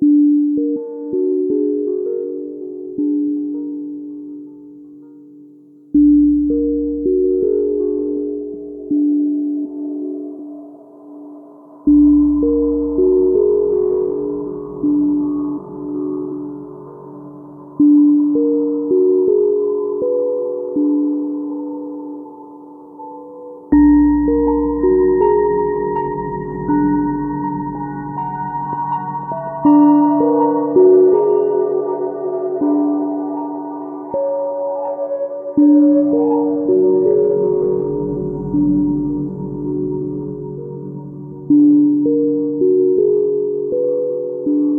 agoraphobic loop; svencoop; rustworld

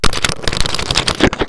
scratching; svencoop; rustworld

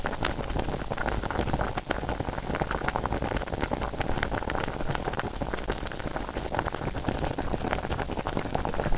Bubbling Cauldron loop; vratsween

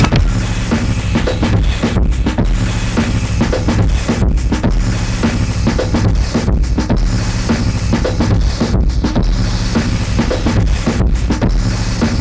loop; lawboss; svencoop; uspninjas

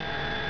wheelchair run; svencoop; decay

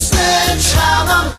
sledgehammer; svencoop; hdmusic; shellhd

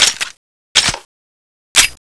generic reload

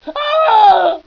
pain; svencoop; decay

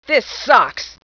attacked; svencoop; decay

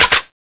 slideback, weapons mac10

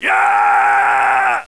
melee; svencoop

